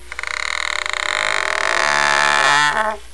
creak

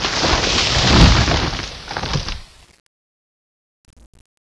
bronto tree crash; ambience

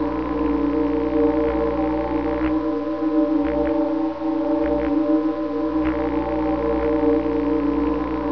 lasers

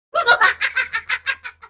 smurf laugh

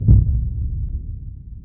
stomp; jurassicpark

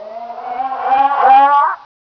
alien moan; ambience

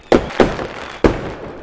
fireworks fast

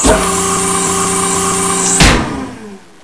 main door; polarcomplex

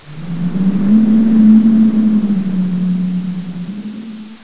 wind temple; ambience

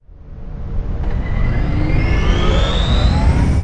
generator up; ambient; shutdown

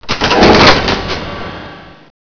piano blam; ambience

